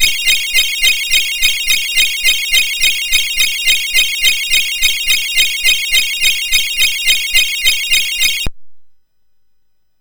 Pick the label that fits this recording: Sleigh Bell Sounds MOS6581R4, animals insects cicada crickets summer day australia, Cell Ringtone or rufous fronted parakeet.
Sleigh Bell Sounds MOS6581R4